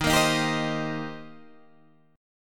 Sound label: Eb 6th Eb down Guitar Standard; Eb6 strummed; JGuitar